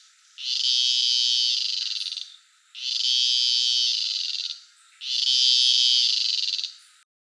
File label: cicada; chicarras; bosque seco